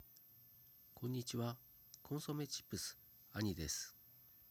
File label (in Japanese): 内蔵マイク・高アプリ